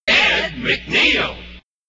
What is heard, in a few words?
jingles shout; positron